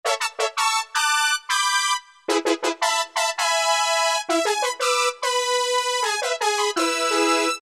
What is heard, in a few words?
Brass Horns, Alesis Micron; Factory Programs; Regal Horns